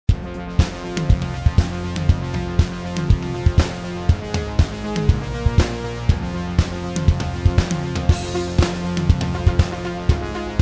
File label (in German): Klangbeispiel Old Style 80